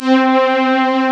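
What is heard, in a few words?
strings C4, Roland, Analog; USB Soundscan vol.49; Analog Fantasies; AKAI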